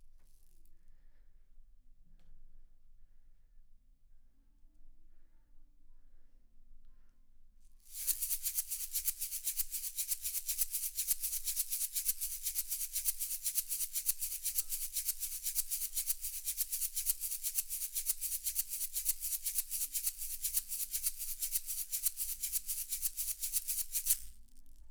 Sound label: Shaker